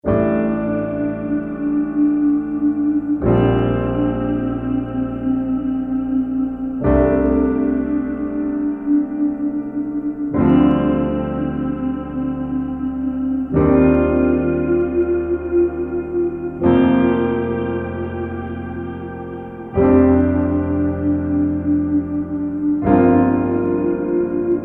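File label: with Guitar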